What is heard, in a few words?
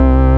ORGAN; Techno Trance